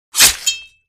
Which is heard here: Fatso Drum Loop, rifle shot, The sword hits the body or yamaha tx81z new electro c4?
The sword hits the body